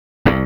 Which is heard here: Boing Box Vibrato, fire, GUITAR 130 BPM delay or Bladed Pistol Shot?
GUITAR 130 BPM delay